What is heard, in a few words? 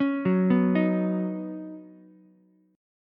Eb Minor 7th Sharp 5th, down, Ukulele; arpeggiated; chord; JGuitar